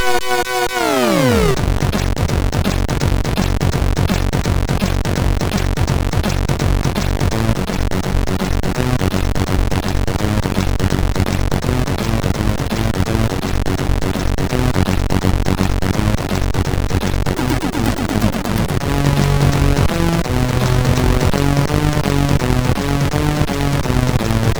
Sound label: sid MOS6581R2